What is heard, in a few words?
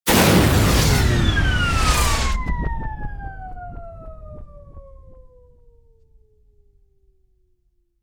Launch and Fizzle, DJ sounds